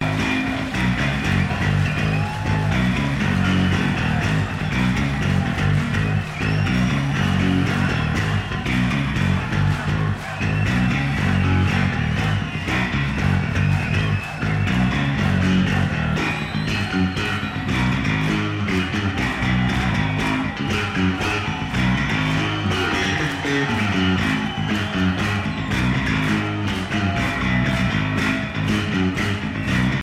Bass Guitar Doodle

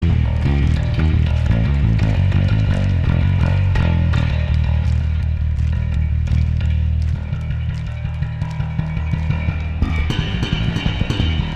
Bass Solo

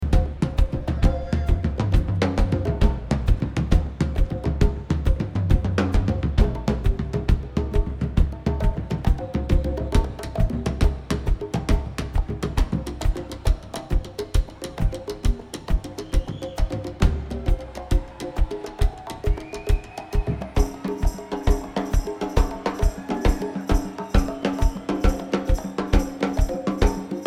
Drums

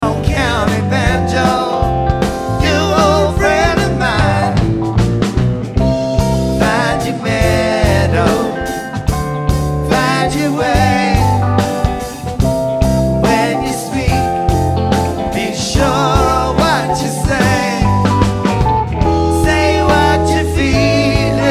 Banjo

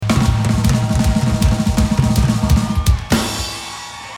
Drum Solo

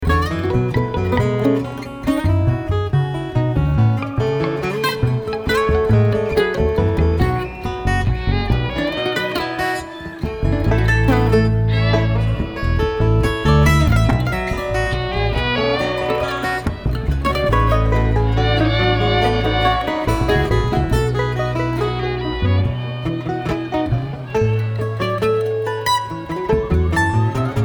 Traffic Jam

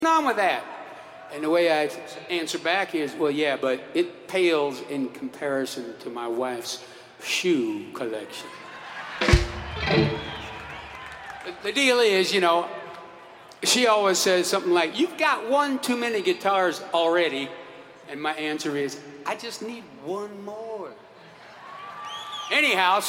Guitar Talk

